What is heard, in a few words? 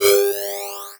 Digital; Adobe; Multimedia Internet CD-Rom Flash